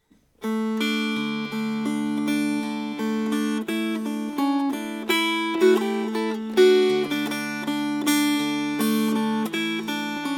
Fingerpicked

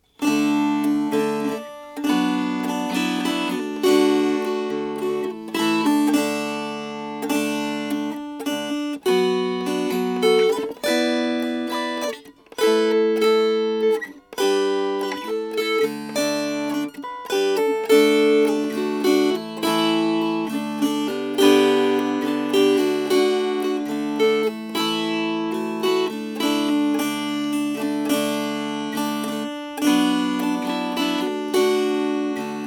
Strummed, Dulcimer

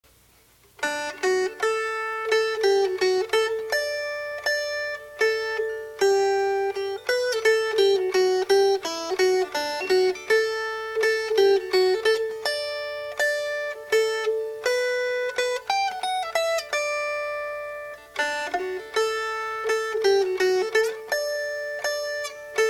Mazurka, Melody

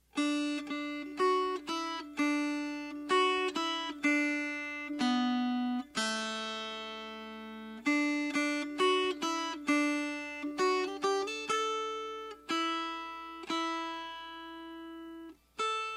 Sailing in the Boat Melody

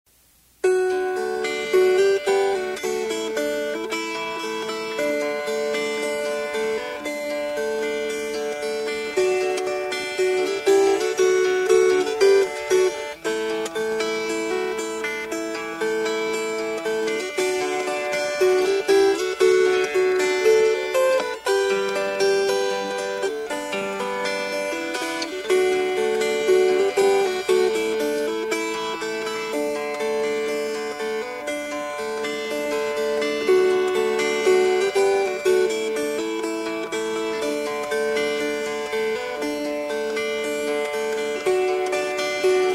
Fingerpicked

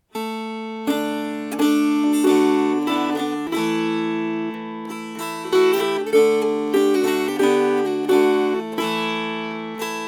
Strummed

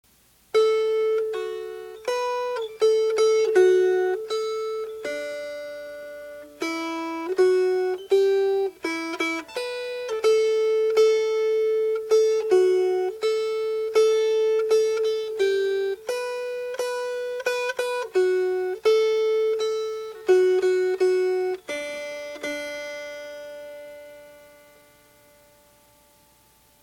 Melody

